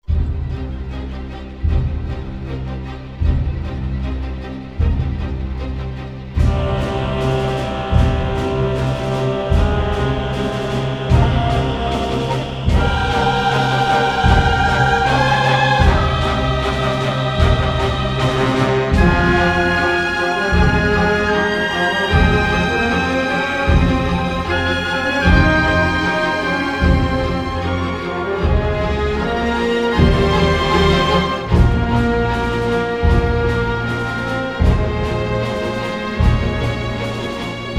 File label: Drums Of War; commandos strike force